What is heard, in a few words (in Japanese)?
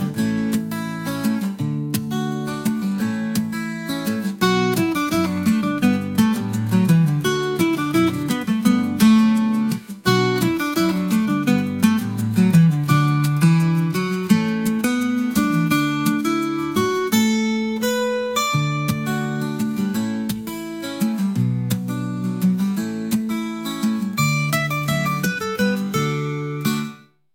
じゃれ合い アコースティックギター